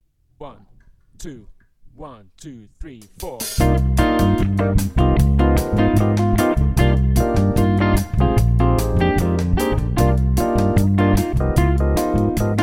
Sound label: New Bossa, Bajo; Players Path Level 3